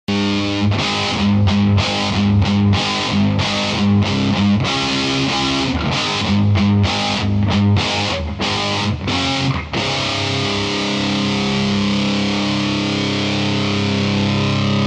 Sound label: badcat double gain